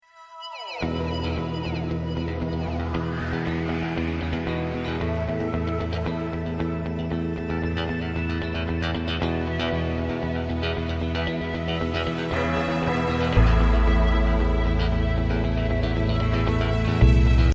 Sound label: beat